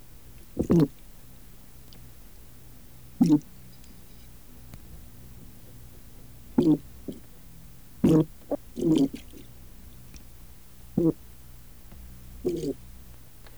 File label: pogonias cromis